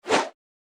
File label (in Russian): Быстрый взмах